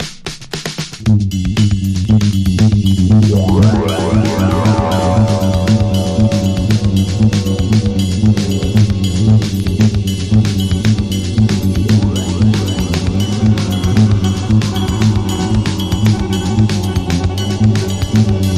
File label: instrumental beats